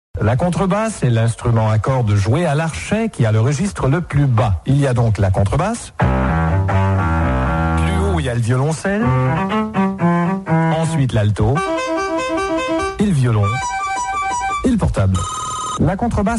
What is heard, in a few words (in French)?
contrebasse; humour musique